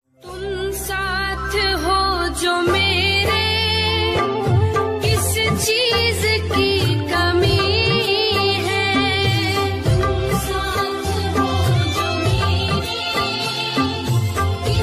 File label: hindi devotional ringtone